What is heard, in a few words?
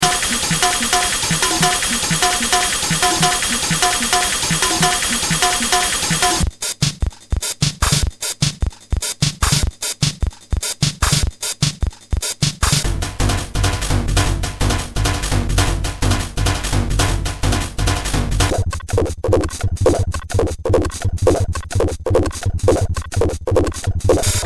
tr505